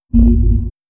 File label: Startup; Windows Never Released Soundpack 1; deviantart